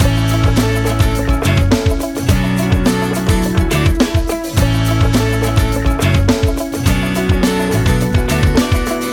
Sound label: Loop